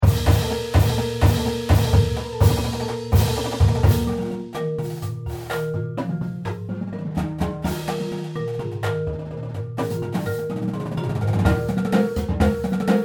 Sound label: Hoedown PERC; OSU 2010